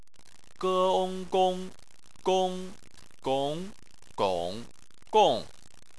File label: gong